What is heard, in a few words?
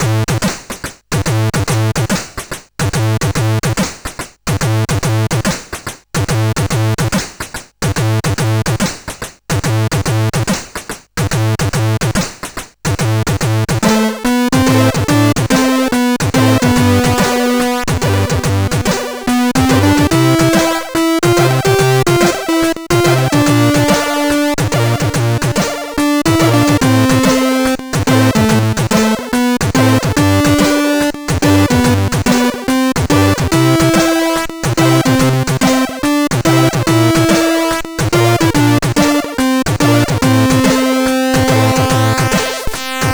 Stumbling, CSG8580R5